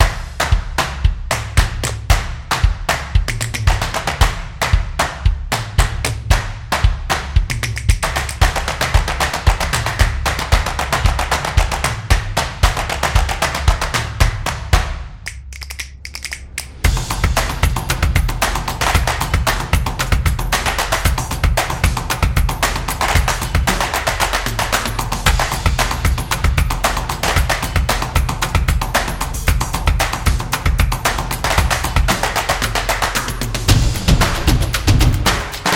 percussion claps drums

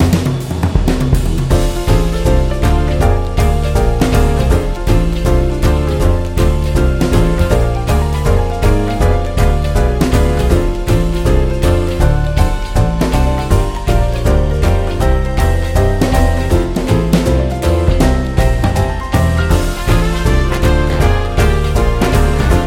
Jazz Blues

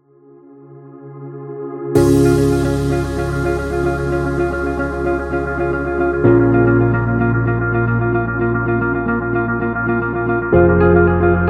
Professional; healthcare

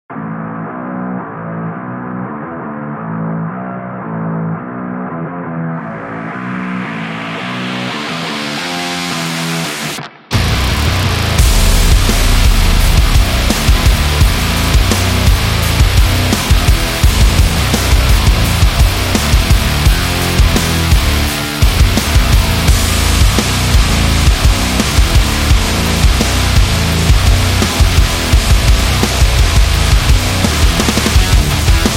This Is Heavy Metal

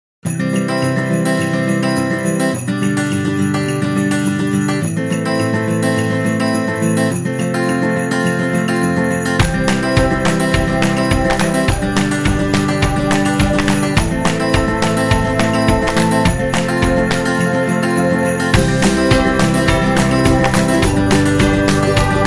Christmas Acoustic Guitar

